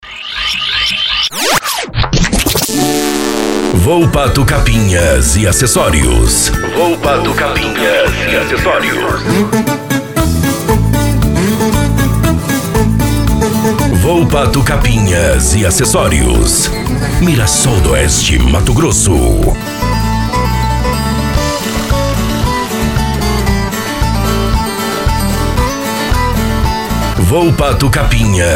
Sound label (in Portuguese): pagode de viola